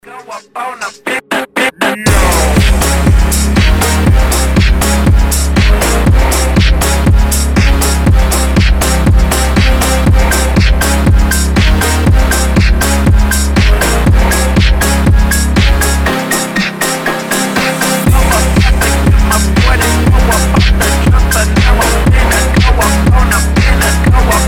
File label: phonk